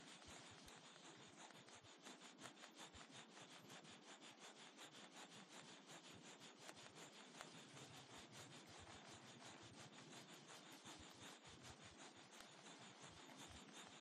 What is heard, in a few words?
Toy train